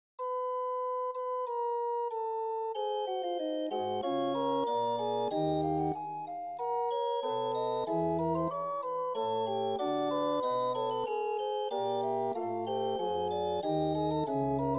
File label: Chromatic canon 23edo